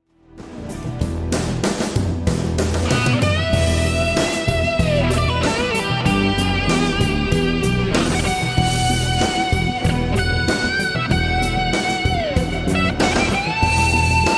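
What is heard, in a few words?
solo Boogie with 88 EQ settings, guitar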